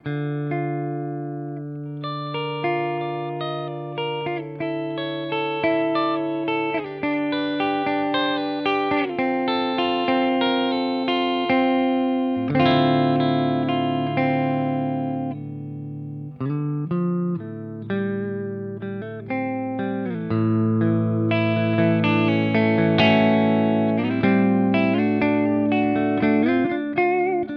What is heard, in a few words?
Pro Mod Clean Both; Charvel Joe Duplantier